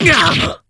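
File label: damage, Japanese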